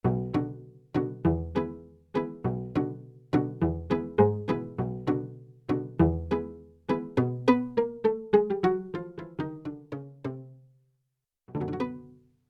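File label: Pizzicato octave unison; Yamaha TX16W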